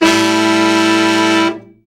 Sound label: BRA SECT; BRA SECTIONS